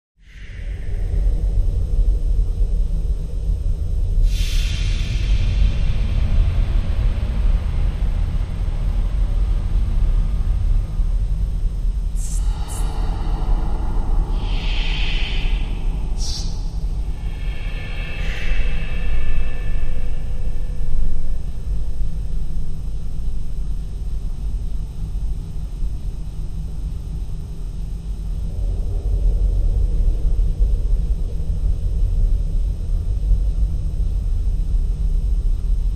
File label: Ambience Cargo Bay